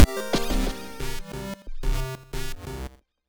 Jungle Sting Reborn

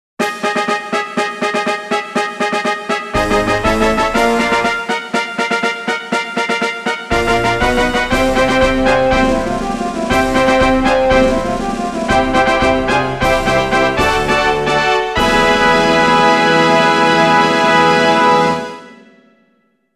Special Race Fanfare; Kyoto - Hanshin

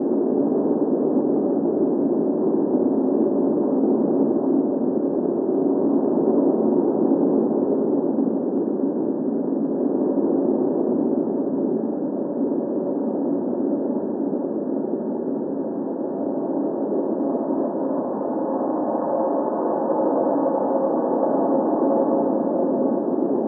Distant Creepy Wind; Zarya and the Cursed Skull

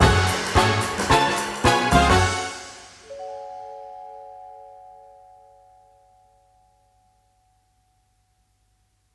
Lose

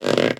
Camp Airbag Hard Hit; Angry Birds Seasons

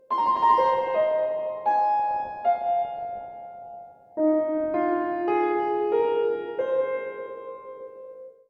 Tension Piano; Mob of The Dead

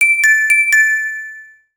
Pinpon

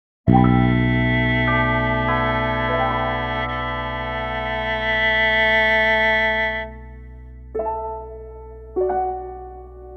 Tension Bridge 99bpm 4bar

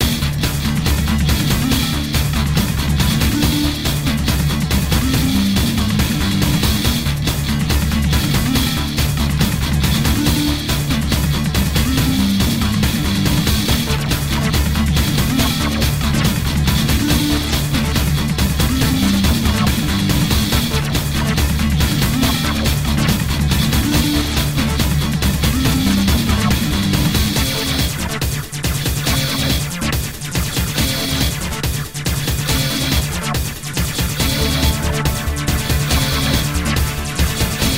Ring Music

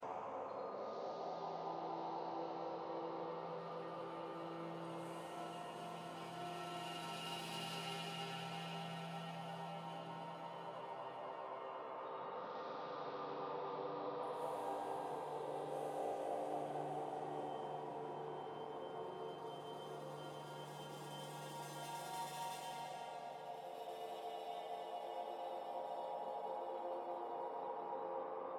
High Heavens Ambience; Tiny Rogues

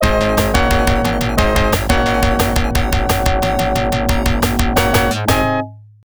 Stage Clear; curse genesis

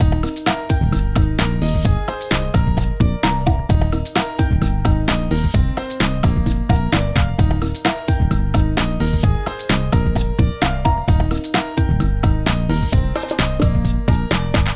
Ingame Cinematic; Dora and Friends; LeapTV

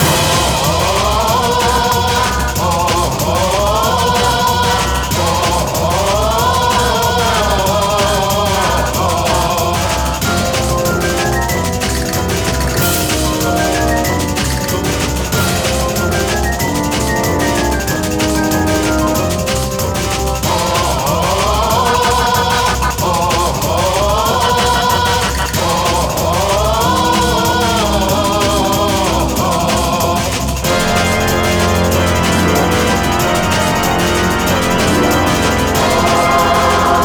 Music evilocity Race Loop

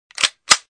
Cannon Load; Angry Duck Cannon 1.5

